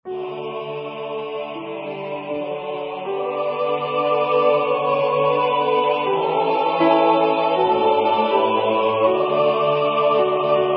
Missa Unitate Kyrie Alto

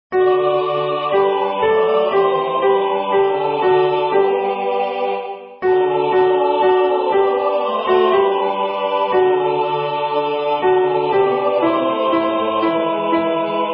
Alto; Hail Blessed Virgin Mary

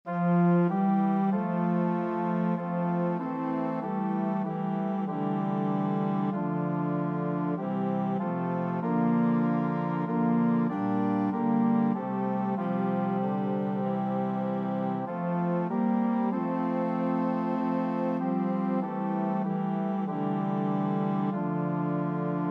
Eu te adoro TTB Organ

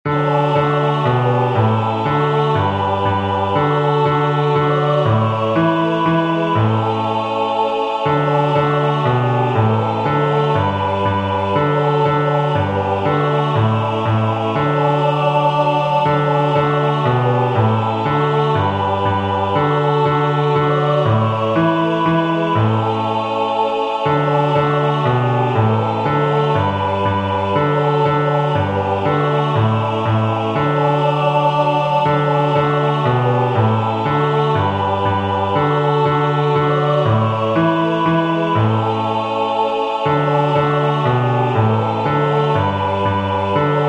SATB Bass; Come Holy Ghost

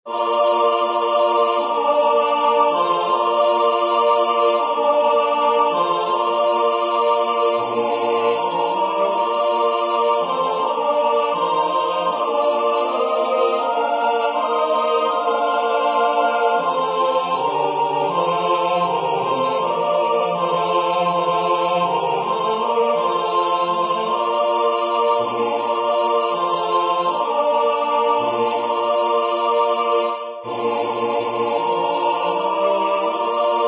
Missa Unitate Sanctus SATB

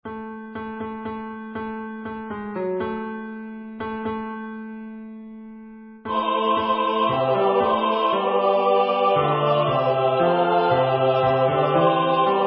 Missa Unitate Gloria Bass

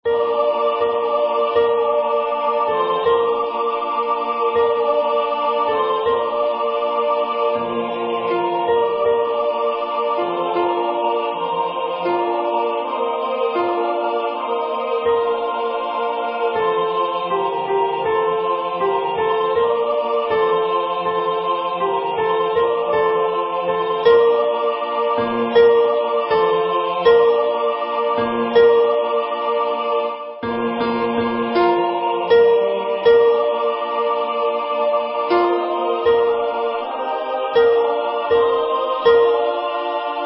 Sanctus Alto; Missa Unitate

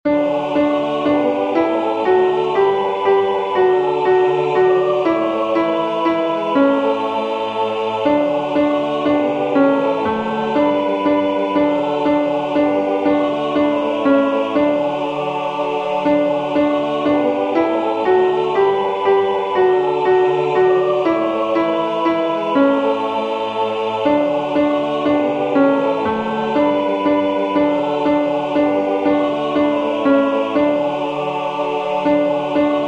Come Holy Ghost SATB Alto

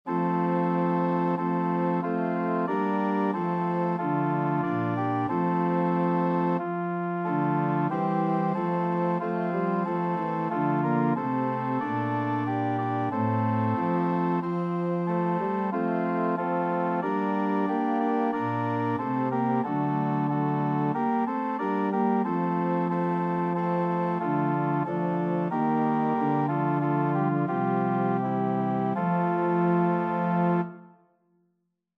Ad te perenne gaudium TTB Organ; LASSUS Annum

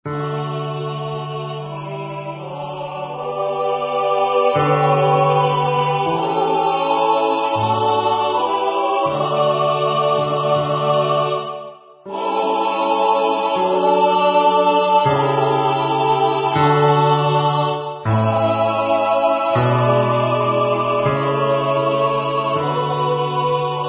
Missa Unitate Kyrie Bass

